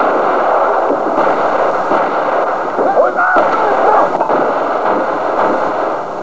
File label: explode